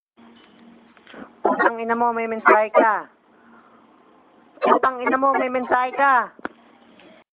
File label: New Sms